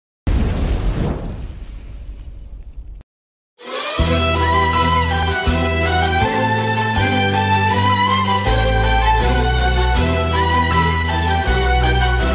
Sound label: bgms beats; life is beautiful; deiva thirumagal